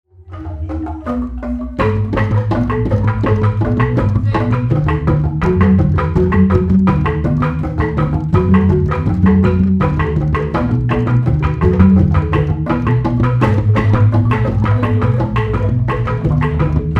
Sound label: Jegog learning kotekan